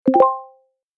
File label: Smartphone notification ping alert tone